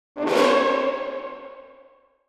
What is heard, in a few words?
Cartoon orchestral danger